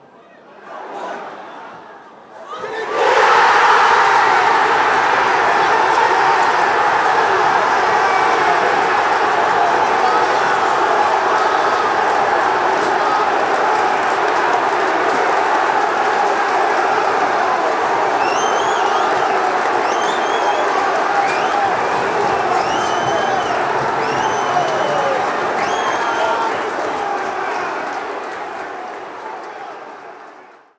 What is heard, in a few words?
goal, fanchants